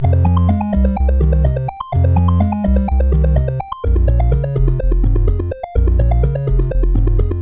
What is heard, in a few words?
sid CSG8580R5